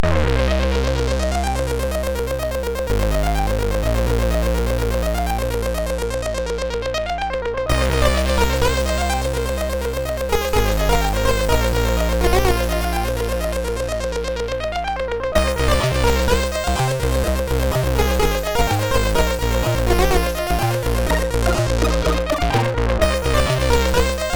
sid, MOS6581R3

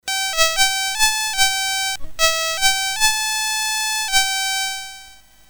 BASIC, MOS6581R4; Commodore; C Users Guide PAL

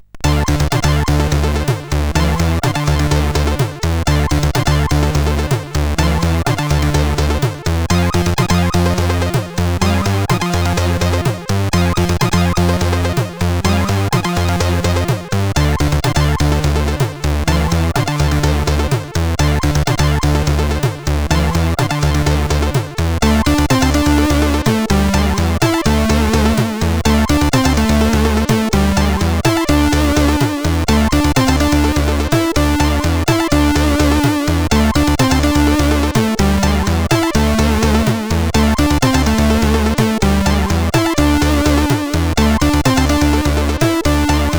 Intro Song, CSG8580R5

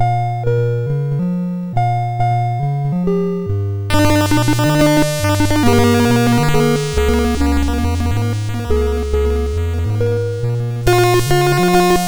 Randomly generated music BASIC, sid MOS6581R2

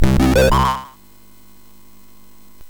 sfx, MOS 6581; MOS6581R4; hvsc

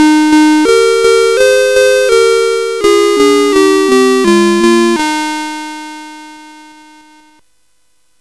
sid CSG8580R5, GAMES; Alice In Wonderland

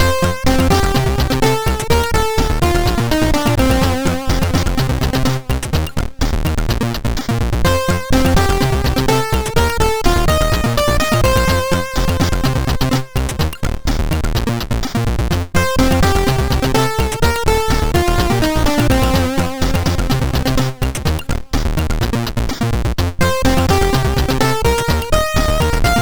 sid, MOS6581R4; Funky Fishs R2-D2

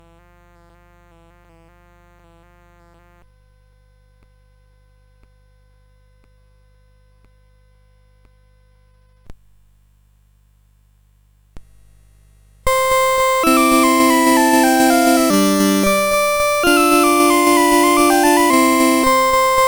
Waltz, CSG8580R5; Joseph A Grau

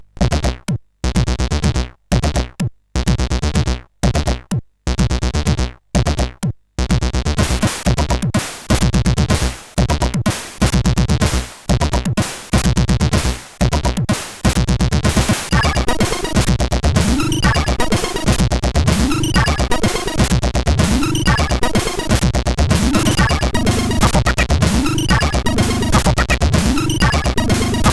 sid, CSG8580; hvsc

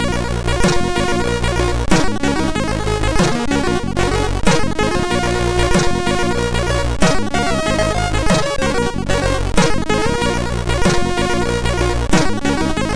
Game Muzak, MOS6581R4; Moon

